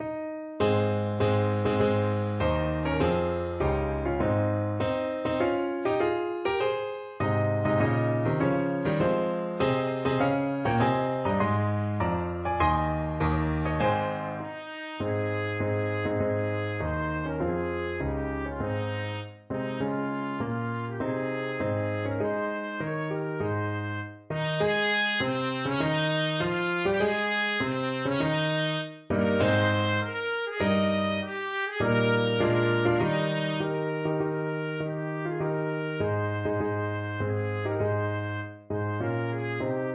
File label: trumpet